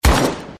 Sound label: ak47 shot